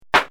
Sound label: GLAM CLAP